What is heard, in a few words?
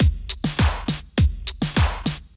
Drums; simple session